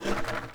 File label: Wood Stool Drag Scrape; Objects